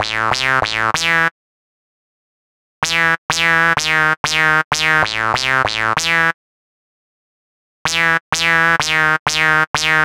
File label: melody